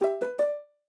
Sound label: Piano